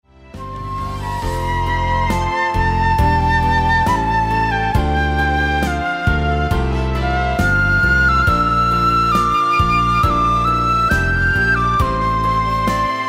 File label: solo, taiko